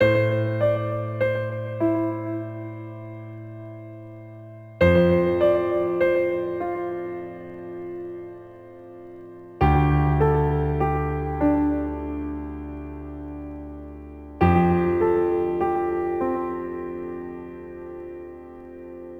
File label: Melodrama; Agatha Christie And Then There Were None